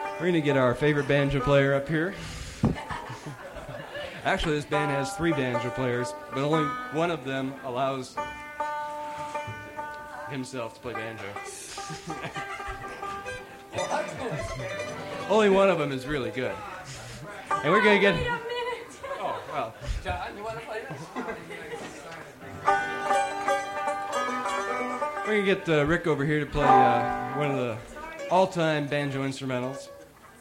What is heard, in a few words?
Banjo Player Intro